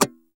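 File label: guitar scrape, Strats; PYB Strats; Clean E.Gtr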